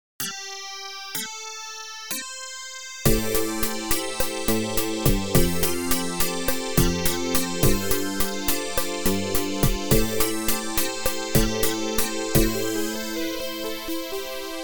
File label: romantic; VGA Spectrum 1; Creative Music Format